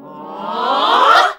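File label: Classical Sing, choir; Best Service Gigapack 1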